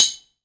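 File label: R-100 tambourine; EdgeSounds; Drum Mashines; R-100 DRUMS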